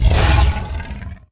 fall; fire tiger

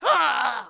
fall; monster

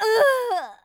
fall; monster; bksoldier

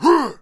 attack, monster; gupae

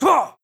combo, shaman, bell

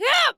attack, monster

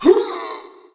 fall; fire ghost

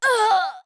fall; monster; bkarcher

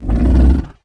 walk, fire man

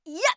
soft, assassin voice

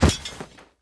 drop; monster; thiefboss2

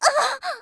fall; shaman

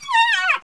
fall; fennec fox

